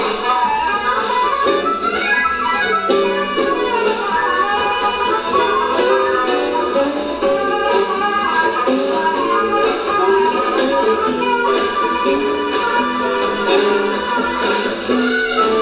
wicked solo

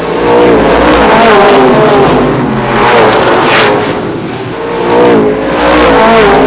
fast lap; automoveis; corridas

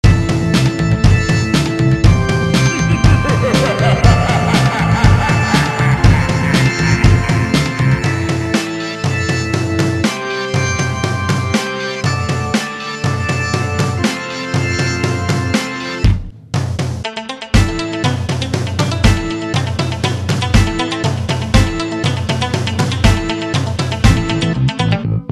Synthetic

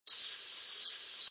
junco; birdsongs

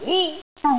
YELL SHORT

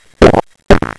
fart

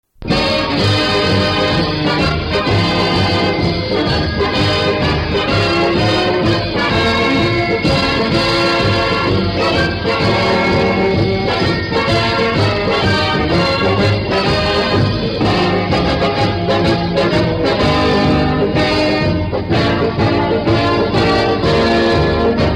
Fight Song Band

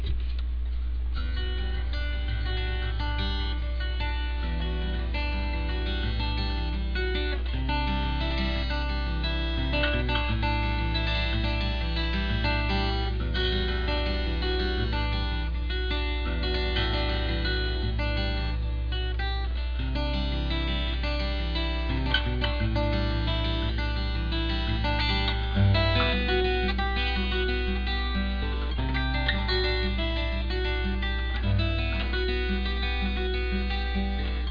frail, guitar

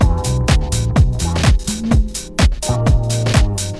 downtempo

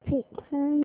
popcorn

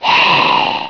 monster

